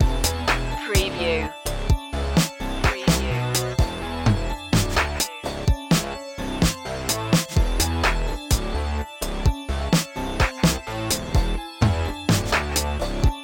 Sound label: electro hip hop inst